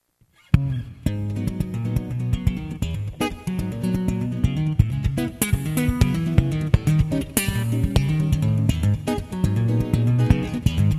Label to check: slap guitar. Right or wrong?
Right